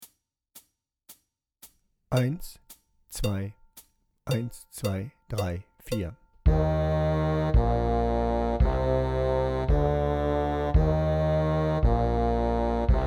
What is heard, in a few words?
Playback 112